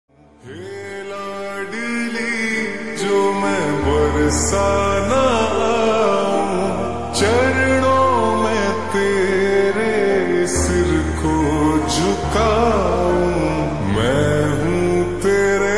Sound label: Slowed Reverb Ringtone